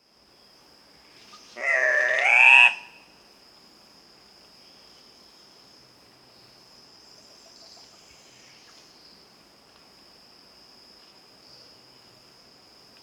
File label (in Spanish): Gallito de las rocas peruano Rupicola peruvianus